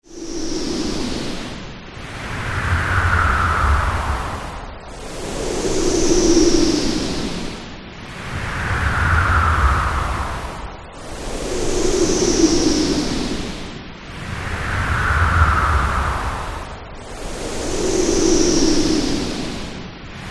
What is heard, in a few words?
Freq shift swooshes